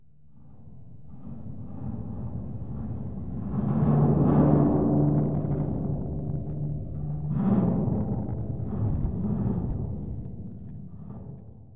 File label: creak; Damage; Barotrauma; Subsurface